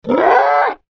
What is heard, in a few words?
Monster Scream Howl; Creature; Horror; Animal; Monster Sound Effects